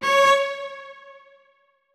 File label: notes, keyboard strings; Hideout Furniture